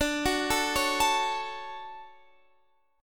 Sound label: F sharp Minor D; F#m/D arpeggiated; chord shape